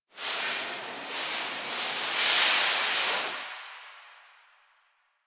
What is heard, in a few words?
Grocery Cart One Off; Warframe; Ambience; Nineteen Ninety Nine; DE